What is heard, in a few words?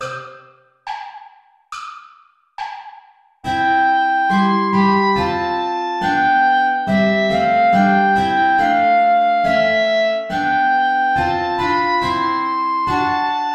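play along without guitar; lobet den herren alle die ihn ehren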